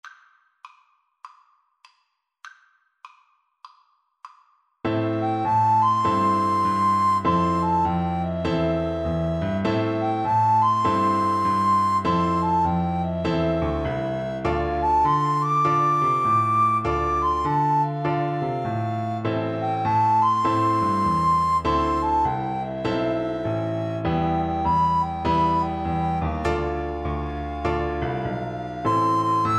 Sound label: Recorder Duet